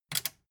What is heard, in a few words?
metal button click power on fast